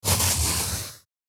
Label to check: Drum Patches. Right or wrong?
Wrong